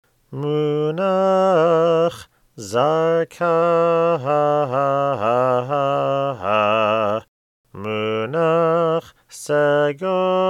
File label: Torah Trope Zarka Segol